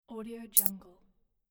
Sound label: selection sound; ldj-audio